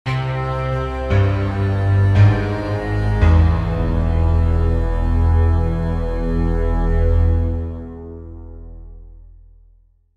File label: Dramatic scary intro stinger